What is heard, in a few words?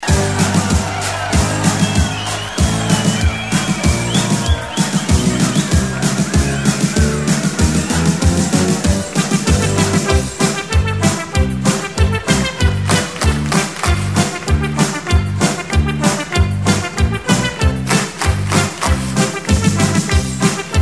usa win; dod; winter